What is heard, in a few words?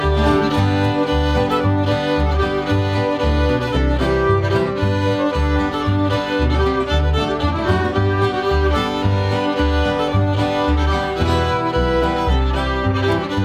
Square Dance; Seneca